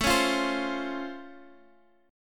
Db Augmented Major 7th, A

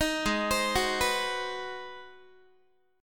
G sharp 7th Sharp 9th, Guitar; G#7#9; 18,16,15,16,15,x; arpeggiated

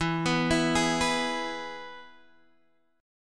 E Major guitar chord, Half Step Down

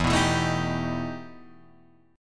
E Minor Major 11th E

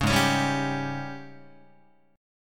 G sharp Major 7th Suspended 4th, Guitar; G#M7sus4 strummed; JGuitar

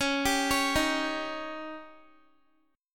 Db Suspended 2nd Flat 5th, Guitar; arpeggiated; x,16,17,18,15,x

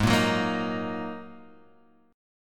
Ab 7th Suspended 2nd down Guitar Half Step Down; Ab7sus2; chordshape

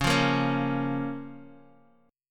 Db 6th Db, down, Guitar; strummed; tuning=4E,4A,5D,5G,5C,6F

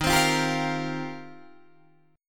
D sharp 6th Add 9th, Guitar, Open D

